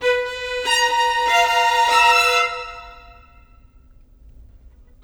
Violins, Cinematic; Cinematic 07; Orchestral Series

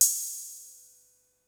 HAT, Hip Hop

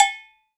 AGOGO BELL, Latin Hand Perc; E-MU Producer Series Vol. 2; More Studio Essentials; Composer

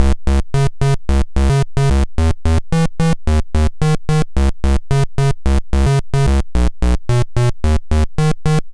Apple II Bass, Chiptune; 8-Bit; Sonic Foundry; Sony Creative Software

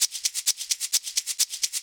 drum 130 hihat, Techno Trance, Drumloops

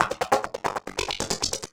percussion loops, Techno, 138

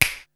POWER SNAP; THE JAZZ

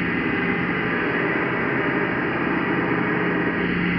sparse soundscape samples; ReLooped

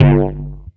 106 Bass G, One Shot Bass

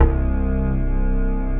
DIGITAL CHASE; Greytsounds; Scott Peers Sound Engineering

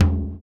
surdo; ILIO; Ethno Techno

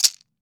MARACAS; AKAI S-Series CD-ROM Sound Library VOL-5

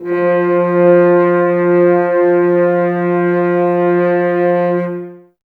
F, Brass, F.Horns, Woodwind Brass Ensemble; Sample CDs; Miroslav Vitous

